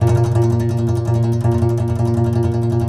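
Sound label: Contrabass Balalaika, Plucked Strings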